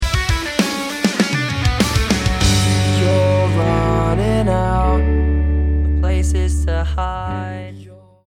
lost round, music

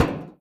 impact, metal barrel

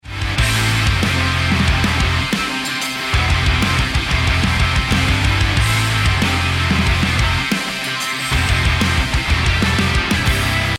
start round, music

